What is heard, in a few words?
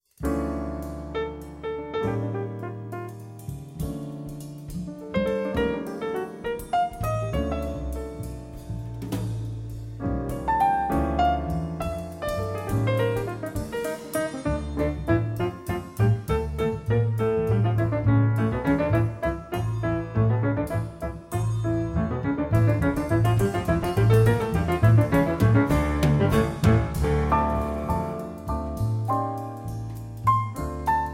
Concerto for 3 pianos in D minor Allegro